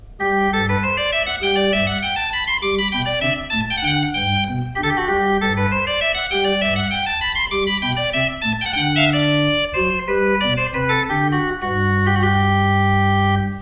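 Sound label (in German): Grenzing 7 II P, pfeifenorgeln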